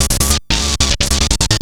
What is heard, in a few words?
Atonaloop, Industrial Analog; Analoops; Sonic Foundry (Sony Creative Software)